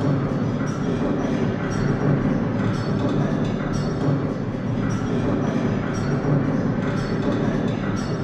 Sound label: ambience Factory; eggomatic